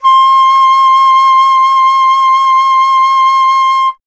flute C; Miroslav Vitous - Solo Instruments VOL-2; Solo lnst. II

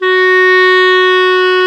CLAR, Clarinets; Roland LCDP04; 90 s Sample CDs; Clar Cls

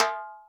percussion, Millenium MPS-400

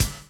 DRUM CHOP; AMG Gota Yashiki Groove Activator

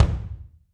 BD, Drums; Bob Clearmountain Drums I

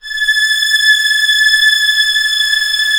STR D#5 FF; Sound On Sound; Peter Siedleczek's Orchestra

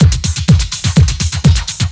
connect four, Deep House 125bpm

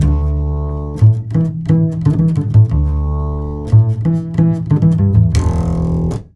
FNK, Jazzistic

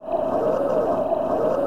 DOPPLER, AMB

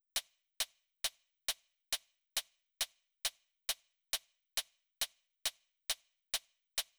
136 Hh; Zero-G Trance Inducer; Construction Kits; 136bpm

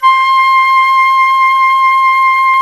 FLT XFLT, Flutes; Club-50 - Foundations Roland

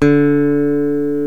FACTOR BASS; Greytsounds

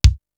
dry kick; East West; Global Sounds

